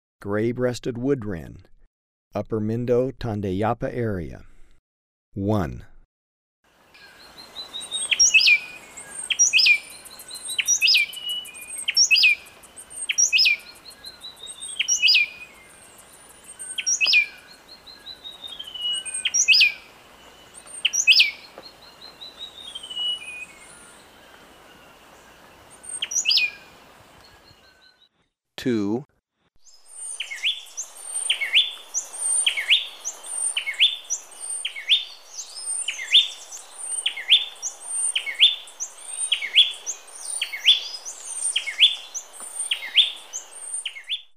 Gray-breasted Wood-Wren (Mindo-Tandayapa), Birds of Ecuador; S. America